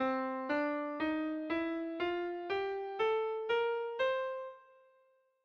Minor BeBop

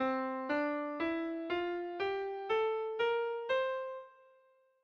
Dom Mixolydian

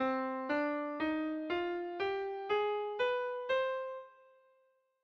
Minor Harmonic

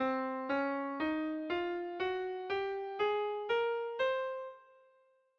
Dom BeBop Half diminished

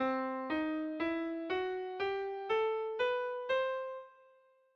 Major Harm Minor 6th Mode

